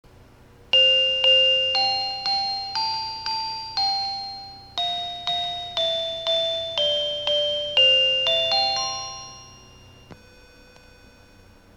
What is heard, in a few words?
485 kirakira uetsu chime; higashinihon